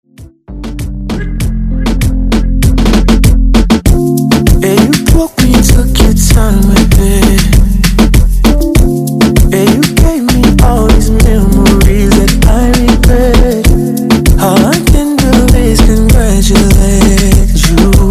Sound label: Moombah Afro Redrum; remixplanet